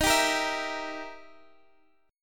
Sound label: E Minor Major 7th Flat 5th, Eb